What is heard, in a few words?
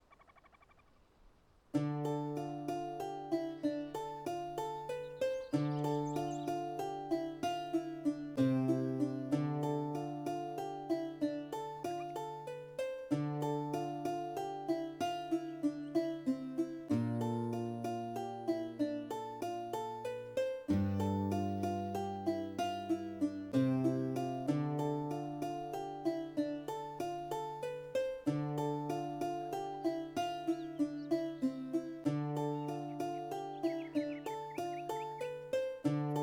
Lute